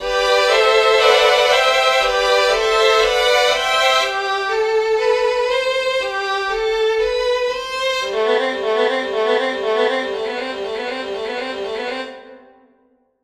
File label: Violin